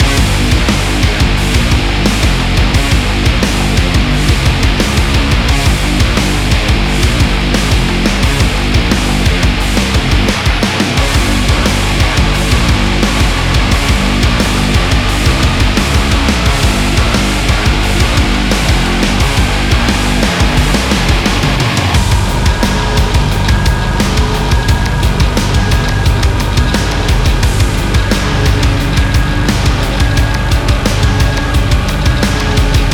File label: Music Song Loop; Hellbound